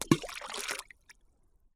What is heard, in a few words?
water splash